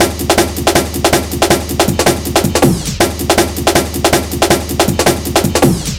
160, Drum Loops; Total Drum&Bass

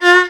VIOLON F3, Arabian Traditions; USB Soundscan vol.43; AKAI

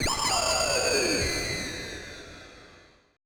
AMBI FX

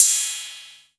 RY CYM

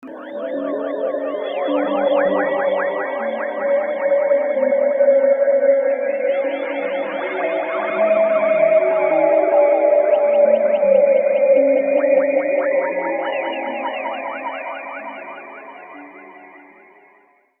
ATONAL WAVE SIGNALS, SLOW SYNTHESIZER WAVES

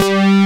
FAT Z BASS; Pro-Rec - Synthsonic; Z1 DANCE

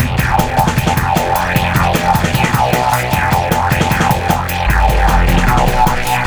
Hardcore Breakbeat, 153 Fmin; Big Fish Audio; Hardcore Breakbeat Action

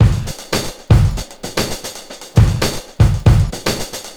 Drums; Sonic Foundry (Sony Creative Software); Stylus Pressure Urban Grooves on Digital Wax

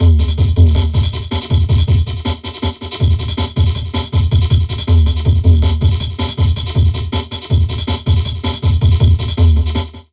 Drum Loops Crazy Processed; 160 CHAOT; USB Soundscan vol.07; AKAI

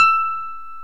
ELEC GRAND; OMI - Universe of Sounds

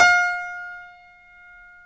9FT GRAND; OMI - Universe of Sounds

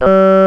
POO, ELEC VOX; East West - Global Sounds

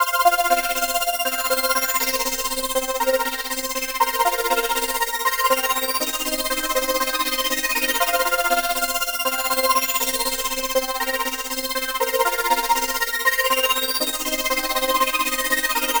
Progressive Synth, Trance

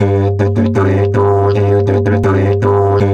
Didge 80 BPM, Didgeridoo

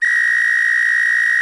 Samba Whistle, percussion; Roland BK-5

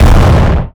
DIRTY, SNARE

